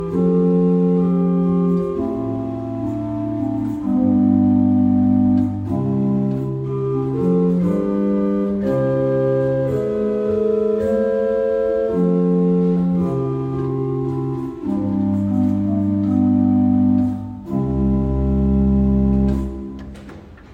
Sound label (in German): Gedeckt 8, Orgel; Dettenschwang; Renovierung